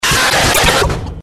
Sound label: jumpscare; slender; tjoc; chica